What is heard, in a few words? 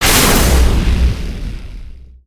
Javelin Impact